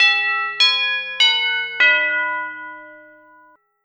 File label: Big Ben, Deurbellen; 500 serie